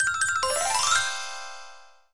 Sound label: Item get